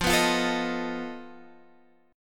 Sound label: F 7th Flat 5th, Guitar Standard; strummed; chord; F7b5; JGuitar